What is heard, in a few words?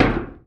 IMPACT Metal Medium Hollow Single; mono; IMPACTS; Universal Sound FX